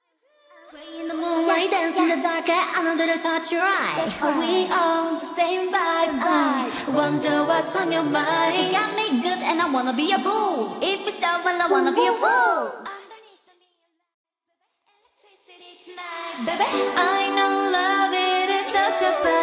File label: Studio Acapella